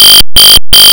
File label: mouse; backtoskool